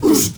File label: MALE WAR, Heart of Africa, shout; Spectrasonics; Heart of Africa 1; CH. SHOUT2